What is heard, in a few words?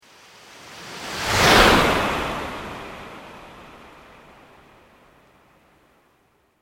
Sweep Organic Slow